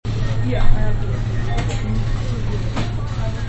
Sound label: van